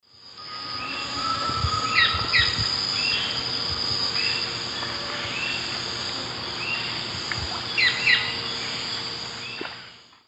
Hemitriccus iohannis, call; S. America; Eastern Lowlands

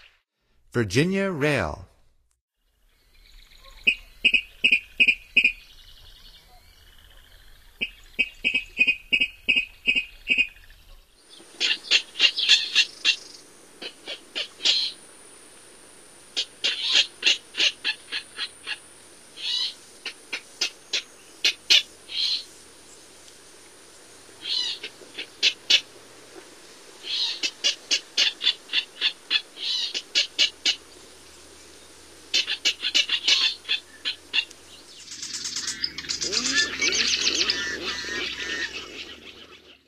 Virginia Rail; North America; Stokes

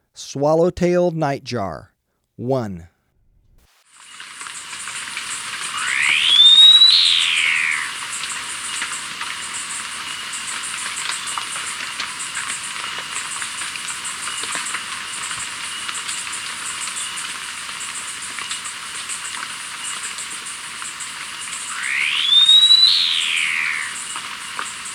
Swallow-Tailed Nightjar, Birds of Ecuador; S. America

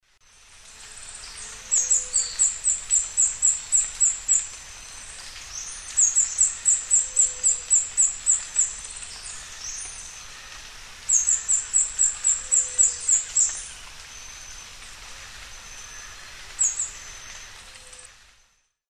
Hylocharis cyanus, song; S. America; Eastern Lowlands

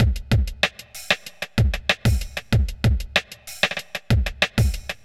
kit; Sound Effect Library

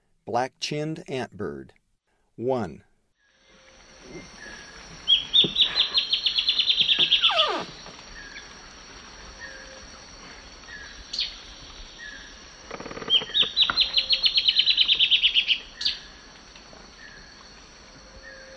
Black-chinned Antbird; S. America; Eastern Lowlands

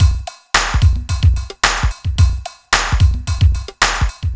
r n b kit 110

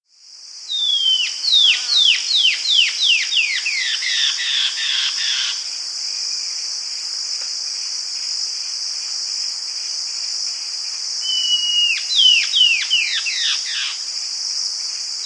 White-Breasted Antbird, S. America

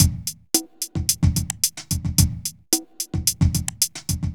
r n b kit 110

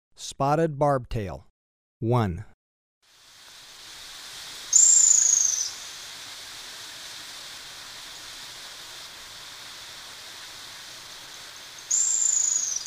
Spotted Barbtail, Birds of Ecuador; S. America

